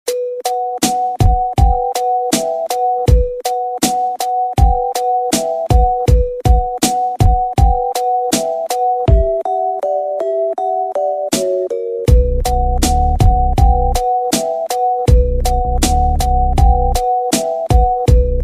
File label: Zone beats